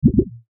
Wobble Board; Miscellaneous Toy Sound Effects; found sounds

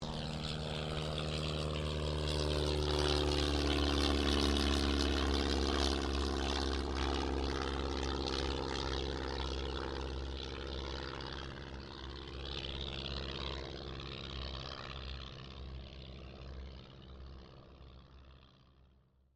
AIRCRAFT AUSTER III TAKEOFF; Airplanes

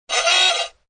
Funny Toy Sound; Funny Cartoon Sound 4; Funny little cartoon sound; Children; Human

